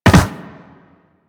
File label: Land Soft Metal, Footsteps; Warframe; Operator